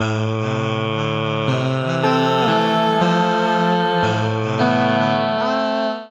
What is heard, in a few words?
Combined Rack A Minor 118 bpm, Harmonic Racks, Voice Box; MIDI Clips; Ableton; Factory Packs